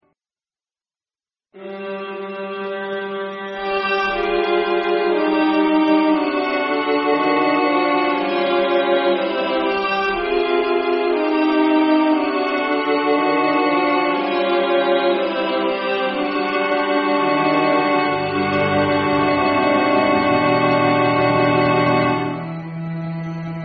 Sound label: for mixed choir; 5 minatures